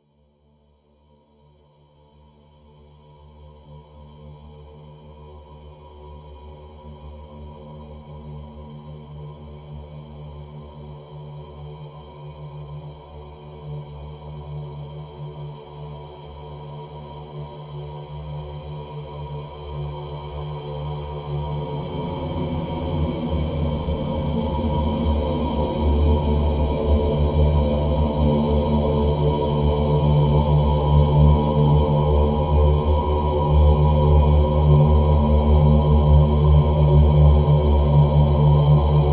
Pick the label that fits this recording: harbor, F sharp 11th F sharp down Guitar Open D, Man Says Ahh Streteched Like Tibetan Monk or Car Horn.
Man Says Ahh Streteched Like Tibetan Monk